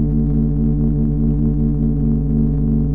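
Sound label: player Vehicle Bass Drone; Cars; Megacity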